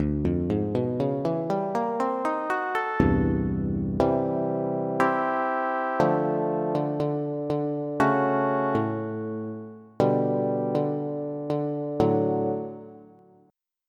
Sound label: Picked Bs.; EverQuest